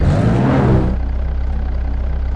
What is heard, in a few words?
revving, vehicles; enzo